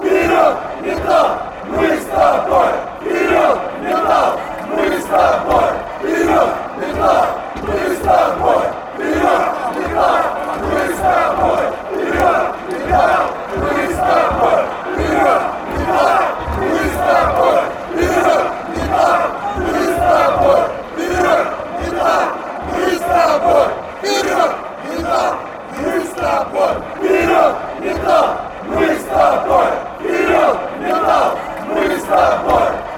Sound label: metalist chant